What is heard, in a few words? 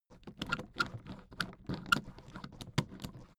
suitcase handle handling